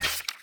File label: ui toast